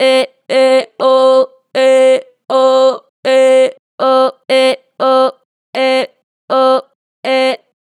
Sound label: Vib Eh Oh, Vocals, Natural Vibrato Strong; Harmor Vocals